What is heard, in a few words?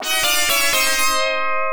Lose a Life; Tinhead; genesis